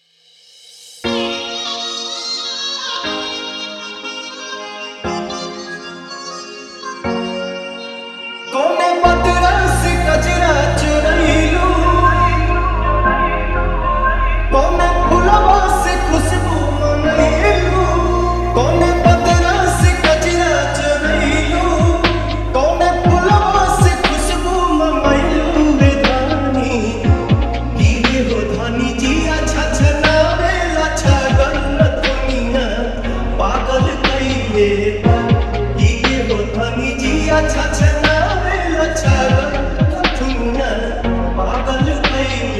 Old Trending Viral Bhojpuri Dj Remix Song Hard Faadu Electro